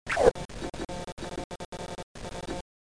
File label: Connect